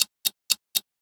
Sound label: Time Is Up Tick